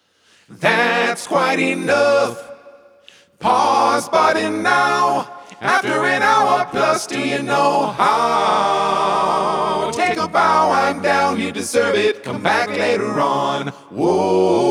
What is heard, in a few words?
Intermission jingle